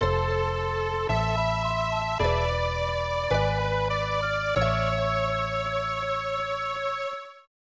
Unused Jingle; Gambler Jiko Chuushinha 2 Dorapon Quest; SNES

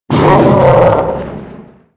launch Bulk; Angry Birds Space

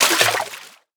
Object Enter Water

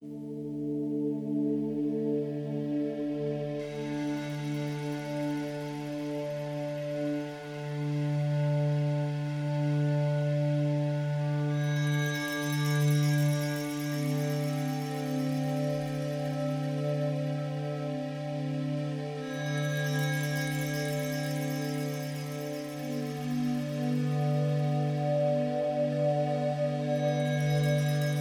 Neutral Ambient; magic mayhem the art of magic

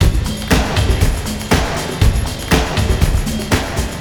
Drum test wet; Nimbus Station; lego universe macos windows gamerip 2010

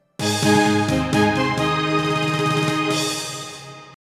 Naval battle victory; Daikoukai Jidai Gaiden; Saturn; 海戦勝利

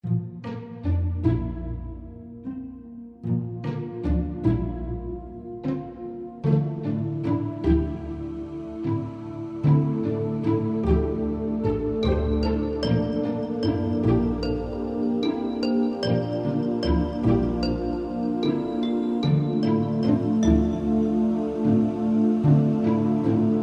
Tree House Music; creepy tale

